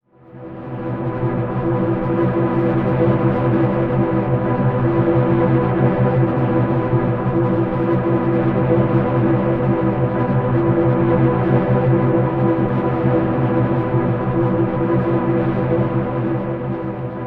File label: Pillar Drone E; Zero-G Seismic Frequencies; Ancient Drones; 90 s Sample CDs